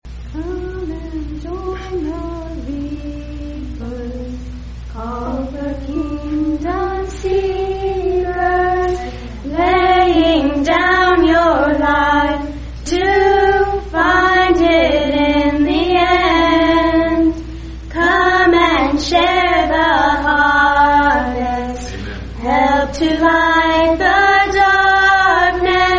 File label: Ladies Acapella